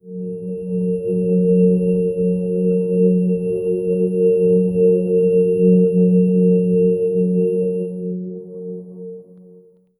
sacred symbols faint mys

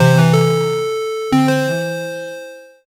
a positive ascending melo, 16 bit

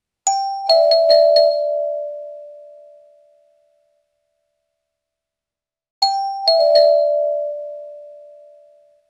a digital doorbell with a modern ring; devices

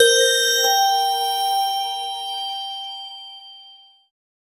a clean digital bell synt, crystal shimmer

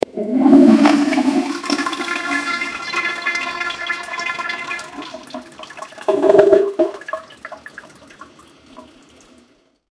a cat meow and a, toilet

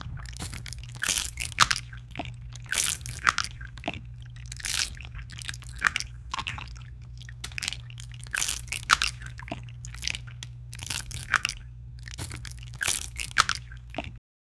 the slurping and crunchin, chewing

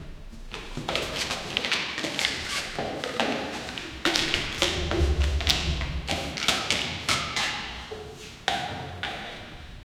crunching and scraping, cave

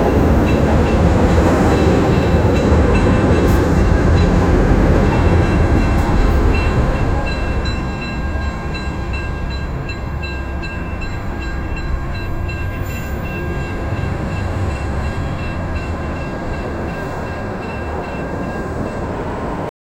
distant street traffic, night ambience

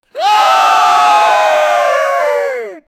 crowd screaming no longes; emotions

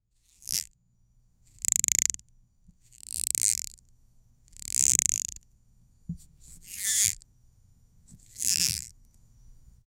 realistic sound of a bows, bow and arrow

